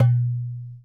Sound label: Dumbek, World Percussion One Shots; Sony CS